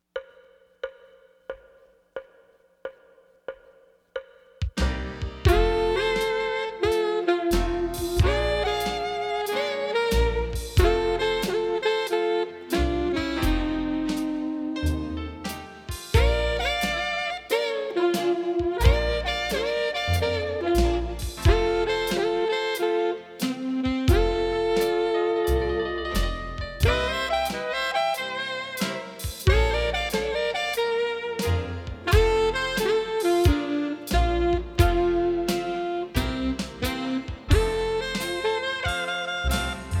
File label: Slow and Easy Blues